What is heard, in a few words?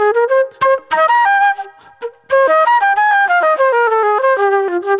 NY 70's Jazz Flute; Sonic Foundry (Sony Creative Software); Manhattan Flutes; Session 01 - Benedetta